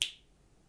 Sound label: yubipati; 指パッチン; 指でパチッ(立体)